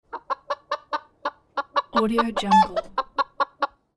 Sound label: chicken sounds